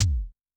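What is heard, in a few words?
bass drum electronic, Kick, Yamaha DTXpress-II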